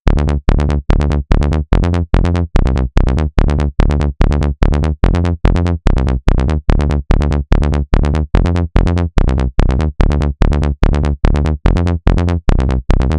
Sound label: Bass Fullon, PsyTrance; LiLA'c Records PsyTrance Sounds 01